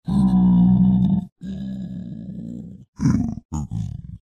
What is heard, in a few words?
zpig